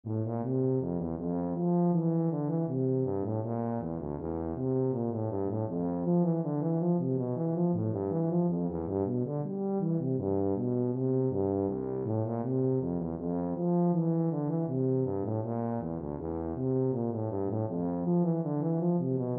bach bourree, tuba

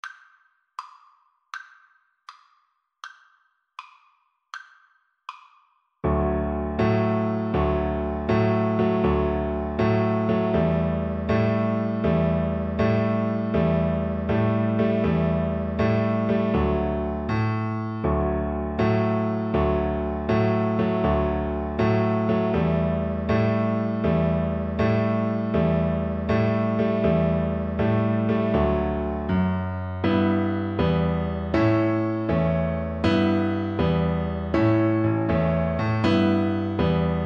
mexican hat dance viola

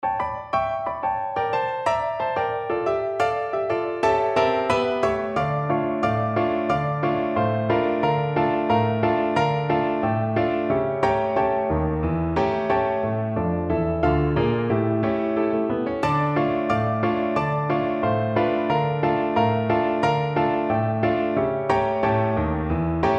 piano

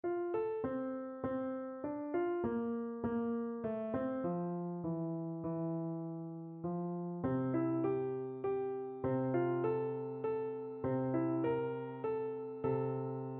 minuet, piano

